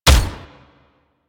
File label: Step Small B; Warframe; Corpus; Jackal Redux